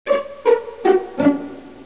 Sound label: PIZZICAT; LOTUS